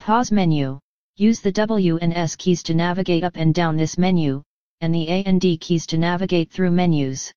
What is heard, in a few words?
menu; DeadFright